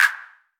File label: TR909 Clap, Roland SH-32 Drumset; Drumset 03